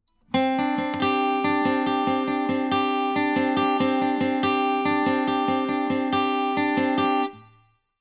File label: guitar Arpeggio